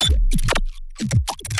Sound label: glitch